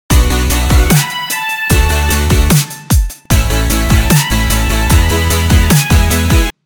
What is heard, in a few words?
Full no sidechain